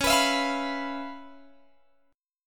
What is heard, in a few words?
Db 7th Sharp 9th Db down Guitar Open G